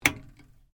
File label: SWITCH RADIO FLIP, Sounds onboard HMAS Castlemaine; Boats; Vehicles